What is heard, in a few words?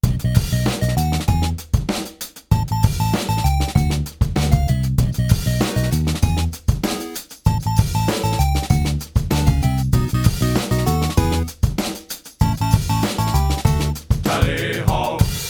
Internet Show Theme